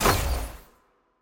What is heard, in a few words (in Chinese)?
进球音效; 战中; nba